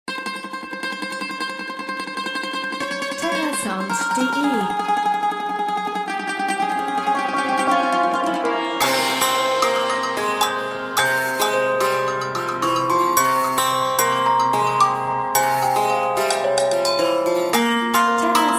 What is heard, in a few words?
oriental feeling; terrasound